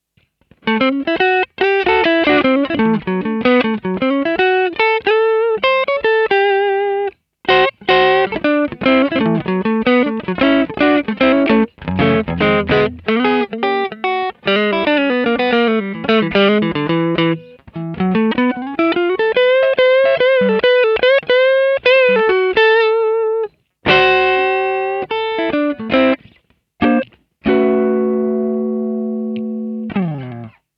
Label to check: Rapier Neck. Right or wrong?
Right